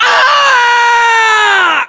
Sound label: melee charge; english; voiceovers